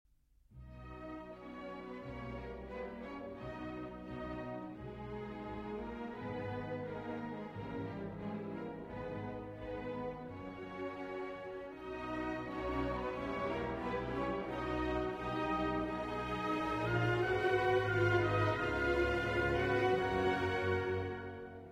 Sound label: Arr. For orchestra, Wedding March; Haamarssi, Op. 3, No. 2